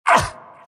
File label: abys pain; abyssal underlord